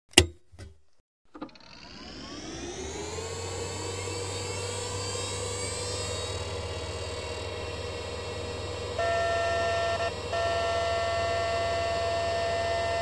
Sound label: Pc Startup Highpitch, hard drive whir